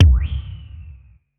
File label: Tech Drum Hit, techno 120bpm